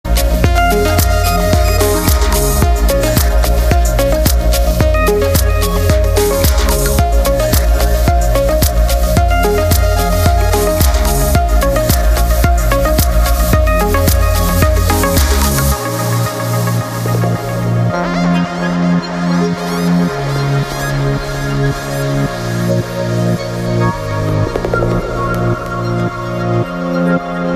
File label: lounge